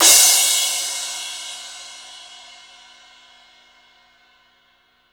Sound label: CYMB; AMG Gota Yashiki Groove Activator; GOTA CYMBALS